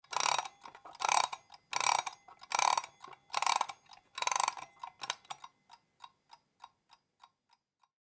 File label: alarm clock analog winding